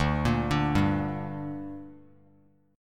D Suspended 4th Sharp 5th D down Bass Standard, chord; arpeggiated